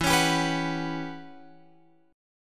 E Minor 6th Add 9th guitar chord, Open F; Em6add9 strummed; JGuitar

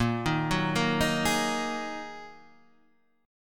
A sharp 7th Suspended 4th Sharp 5th down

